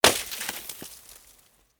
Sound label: miss, snowball; cstrike; cwapi; byMayroN